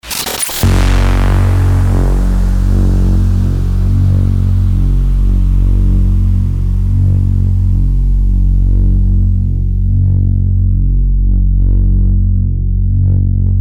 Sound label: DRONE THE DAWN 126BPM F#MIN